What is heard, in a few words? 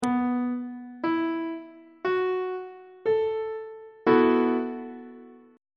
c flat dominant 7th suspended 4th chord; on treble clef